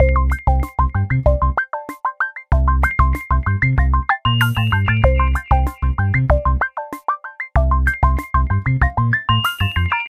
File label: Jingle Waiting Loop; Super Rub 'a' Dub; PS3